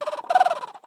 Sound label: Woodwind-Pigeon